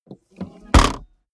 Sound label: car bmw e46 exterior trunk close slam